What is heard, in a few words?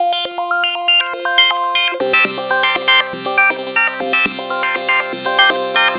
Synth Ribble Lite, Synth Textures; Sonic Foundry (Sony Creative Software); The Electronic Music Manuscript Collection